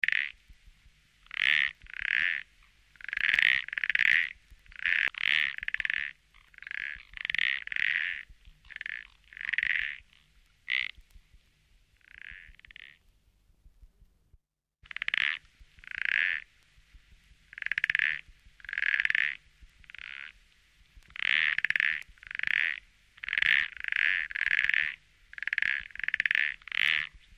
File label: atmo frogs spotted toad